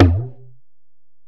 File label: Ac Perc, Drum Machines Alesis DM-PRO